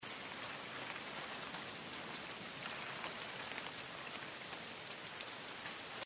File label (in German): kuekensounds; inside